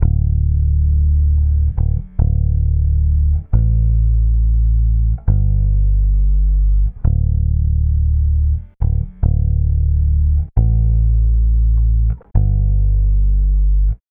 Bass, Indie Rock; Sonic Foundry (Sony Creative Software); Indie Rock Anthems; Session 04 - Dubwyzz

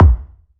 BD, BASS DRUMS; EdgeSounds

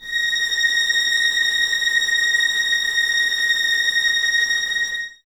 VS A#6 Tremolo, 11 Violins; Miroslav Vitous

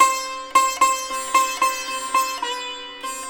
SITAR, Indian Traditions; USB Soundscan vol.45; AKAI

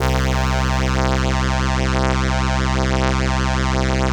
ELKA, String Machines; ELKA RHAP

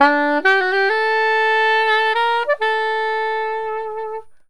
SAX, Balkanish Traditions

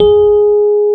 G#3, Electric Pianos; Wizoo

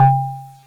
Drums, Hits; DCM8

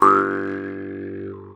MT G, JHARP G B; Xsample VOL-9

